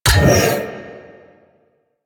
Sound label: Library Hologram Turn On; Duviri; Library Island